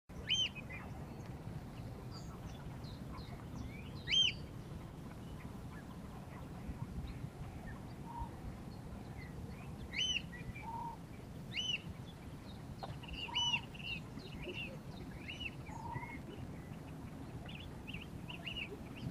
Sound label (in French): huitrier pie, haematopus ostralegus